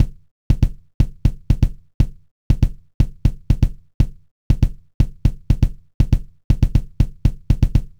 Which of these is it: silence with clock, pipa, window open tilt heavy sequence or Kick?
Kick